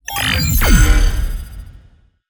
ui boss round secret; autochess

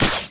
sword far

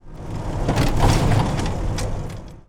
car jolt

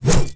machete

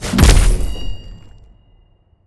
cypunch

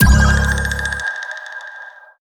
lantern

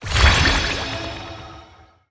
shotgunxdouble

